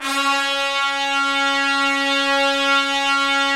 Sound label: Trumpets; Roland - LA Composer vol. 4